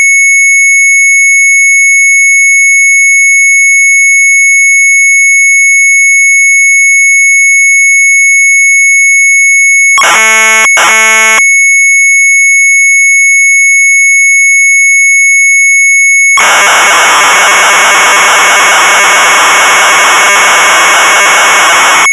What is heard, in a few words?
Sharp MZ800 Tape